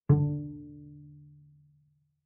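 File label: Bass pizzicato fortissimo sul D D2, Double Bass; MIS Pitches - 2014; Strings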